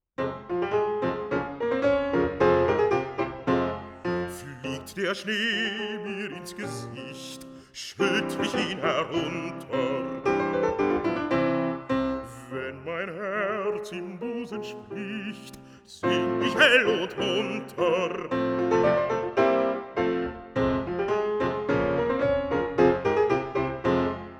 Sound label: Winterreise, song cycle for voice & piano; Book II, Mut; Schubert